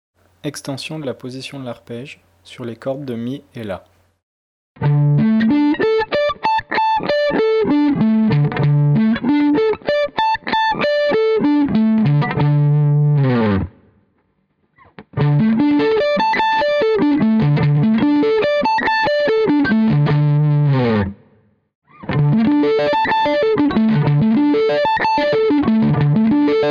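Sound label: fifth arpeggio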